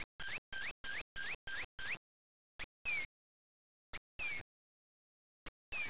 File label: cardinal; 12000 Graphics Pack - A Complete Graphics Collection For Your PC